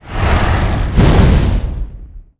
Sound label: skill, fox ninetail, monster; metin2